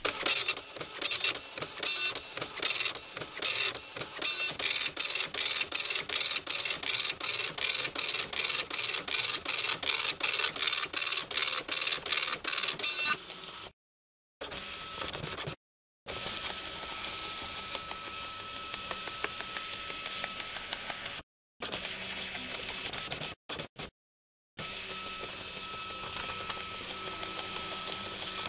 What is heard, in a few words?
matrix printer; devices accessories utilities; NS Studios - ultra sound collection